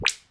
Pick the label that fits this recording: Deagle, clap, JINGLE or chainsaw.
clap